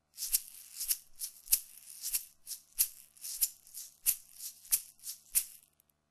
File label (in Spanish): huevos, shakers; par de huevos; diversos colores